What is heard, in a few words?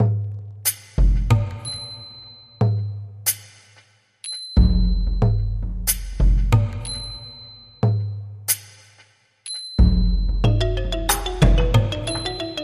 Ranat EK